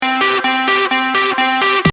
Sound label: Synth; chord quiz